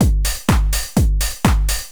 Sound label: DRUMLOOP; eJay